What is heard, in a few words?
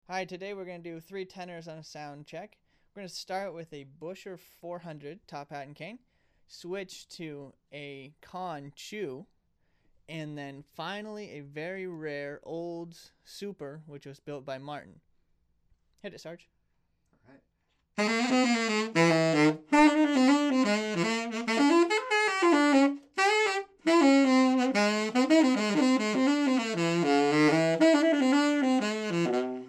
top hat vs Chu vs olds super, sax